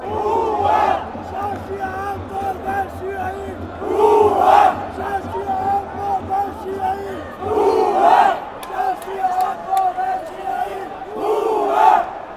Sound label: randers chant, fanchants